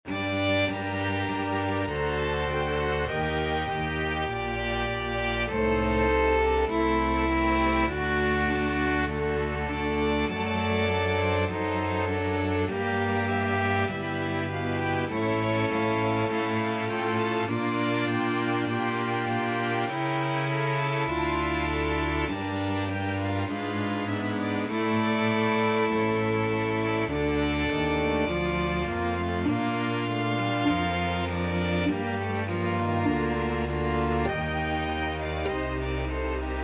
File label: sonata, string ensemble; eccles